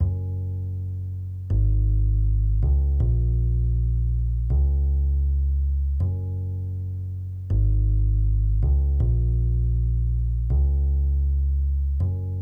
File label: 80 BPM F# Min Bass, Lofi; Melodies; Loop Stems; Cymatics; GHOST (Beta Pack); Blue Wish